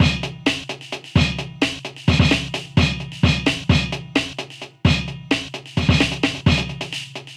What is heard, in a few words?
DnB Beat 164bpm, MIDI Drum; Ableton; Factory Packs